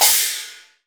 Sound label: CHINESE PRC, PERCUSSION